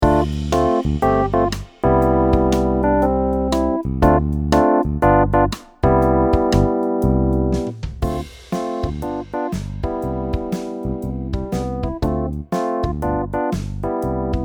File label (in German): jazz kadenz 251